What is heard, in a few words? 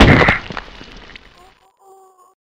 big fall damage sound effect